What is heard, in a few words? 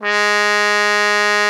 cup G#3, cup mute; Xsample VOL-3